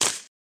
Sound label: creature misc